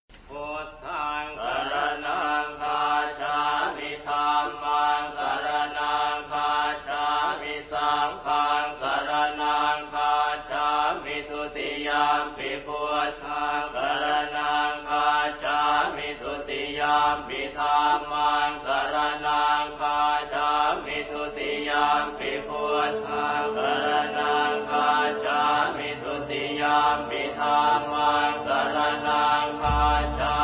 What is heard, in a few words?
Traditional Thai Music